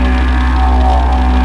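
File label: electric beam loop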